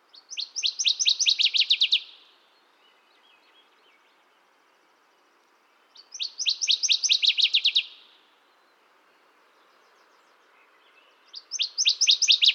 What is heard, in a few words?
Northern Waterthrush